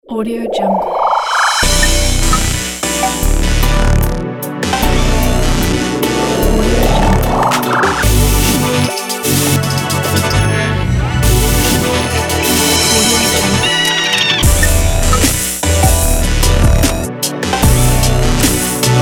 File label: future bass; audiomania